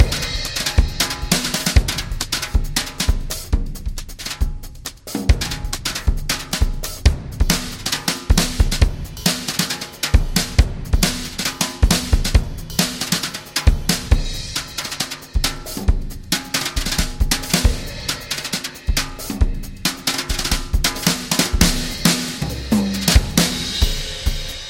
Jazz Drums Solo

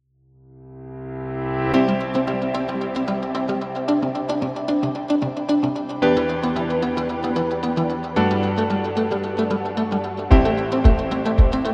Slow Background; healthcare

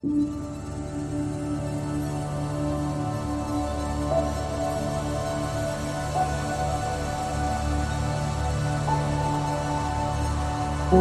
Ambient Atmosphere; epic action

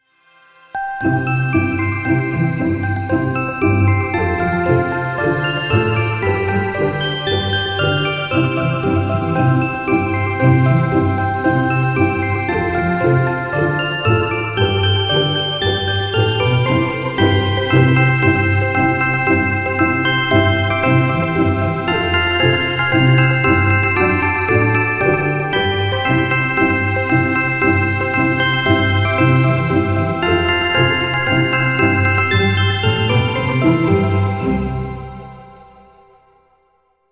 Jingle Bells Christmas

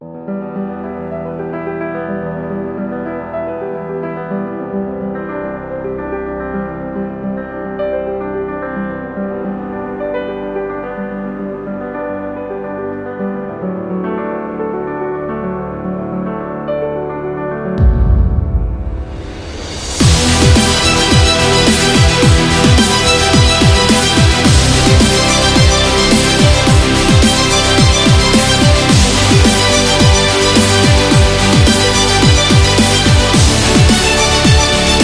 Epic Action

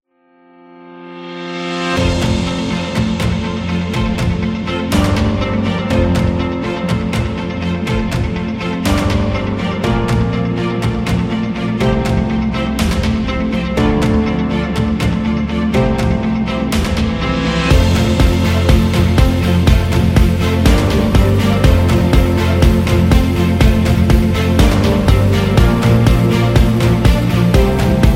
Motivation Background

